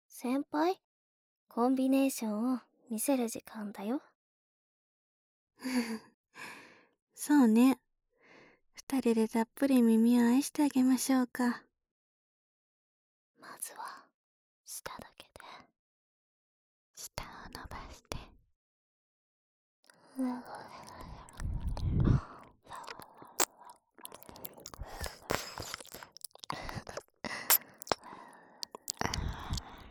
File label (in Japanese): コンビネーション による 耳舐め